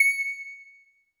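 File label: Jazz Harp C#4; Kawaii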